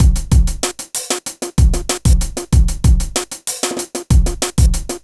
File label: kit